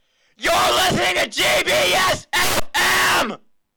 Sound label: scream